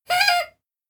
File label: notification; samsung Aleart